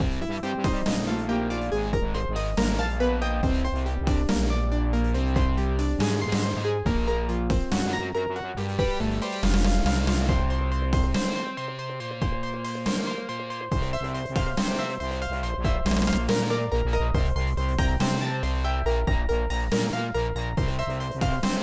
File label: Win Loop; Boulder Dash 30th Anniversary